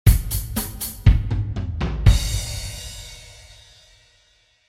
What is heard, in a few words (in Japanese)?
8ビート